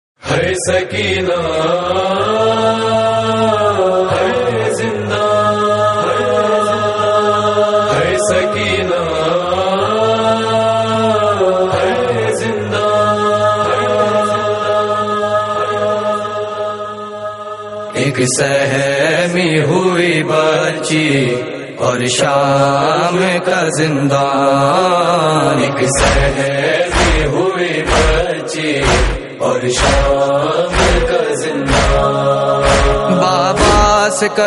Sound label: Noha; Shahadat Bibi Sakina